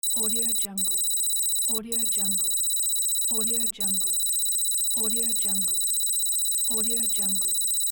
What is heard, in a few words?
computer digital text; andrewsound83